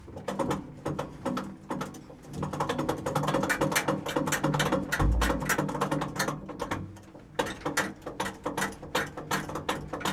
T-34 foley cannon height adjustment, Russian World War II Tank; T-34-85